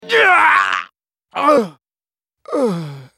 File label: dying; Swain; en US